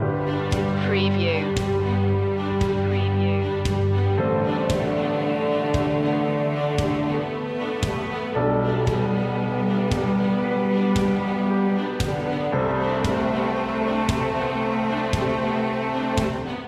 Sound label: a positive world loop